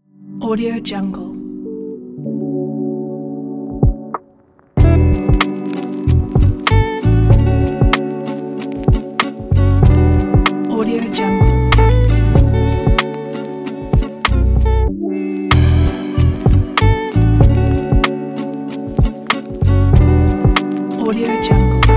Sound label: summer lofi vlog